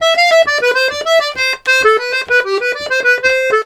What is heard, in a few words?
accordion, Complete Accordions; USB Soundscan vol.40; AKAI